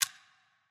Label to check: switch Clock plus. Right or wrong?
Right